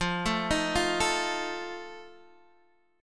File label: F 7th, Guitar, Half Step Down